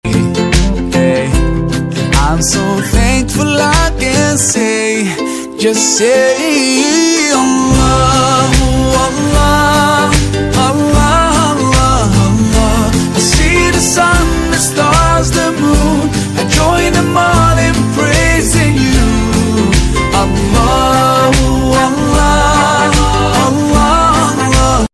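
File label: english islamic ringtone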